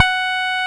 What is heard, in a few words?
Roxichord, E.Piano